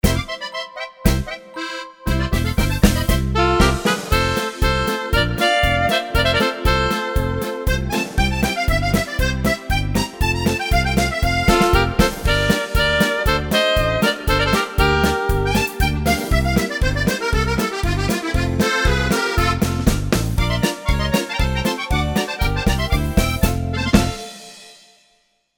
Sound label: Italian Polka